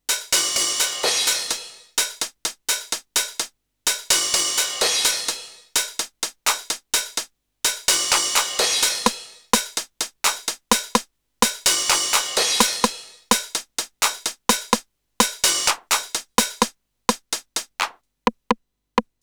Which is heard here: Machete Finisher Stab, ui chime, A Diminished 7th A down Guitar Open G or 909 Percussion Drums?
909 Percussion Drums